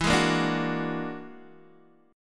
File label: E 9th Flat 5th E down Guitar Open D